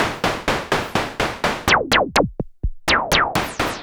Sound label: SH101 Clocked LFO Rhythm (125BPM)